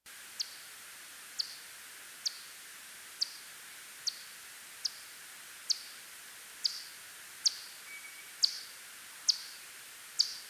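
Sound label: Myotis mystacinus, bat; Boehm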